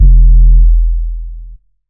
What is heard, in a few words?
deep sub hit